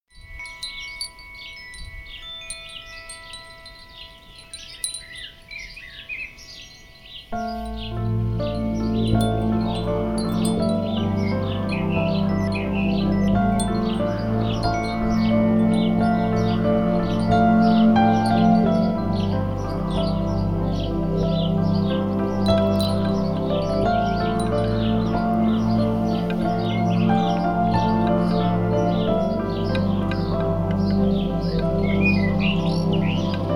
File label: Happy rising